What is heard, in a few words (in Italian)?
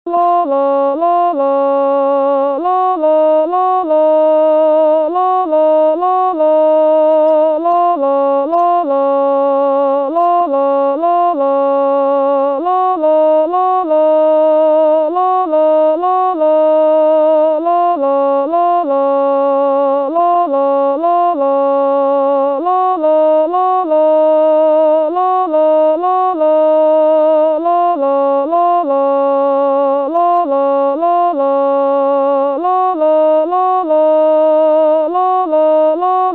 contralti; musica afroamericana e work song